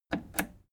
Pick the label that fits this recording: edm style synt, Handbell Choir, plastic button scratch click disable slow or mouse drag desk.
plastic button scratch click disable slow